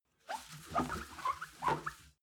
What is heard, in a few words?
clean window paper towel close